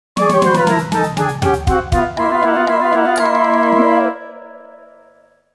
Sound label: failure fanfare